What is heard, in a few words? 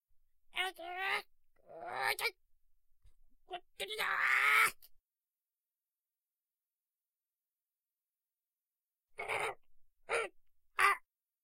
Parrot